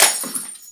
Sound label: Glass Hit